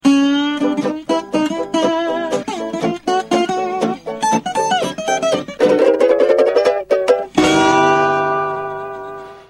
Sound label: music; spongebob